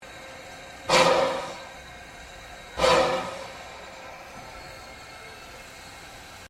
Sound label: Train NA Class Chuff, Steam train; PUFFING BILLY 1908; Melbournes classic Puffing Billy Steam train; Trains